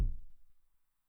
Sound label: BD MOD [PItch+Depth], 909mod, BASS DRUM